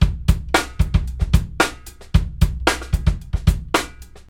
112 bpm drum loop b key, Free breakbeat sample - kick tuned to the B note. Loudest frequency: 1000Hz